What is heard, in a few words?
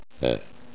grunt